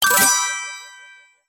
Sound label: collectible bonus collect; c trophy